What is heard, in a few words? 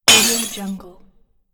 glass